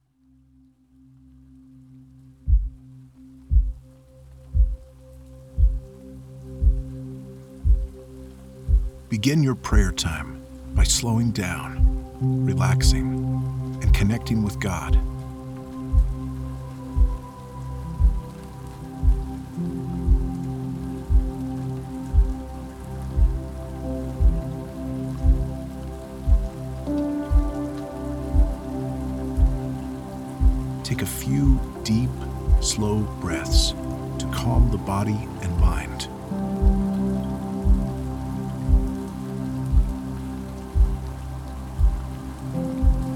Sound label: bilateral rain